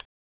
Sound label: short sound, sport